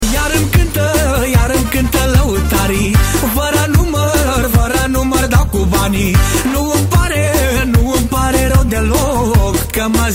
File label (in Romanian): lautari; cstrike; jb icegame; sunete vip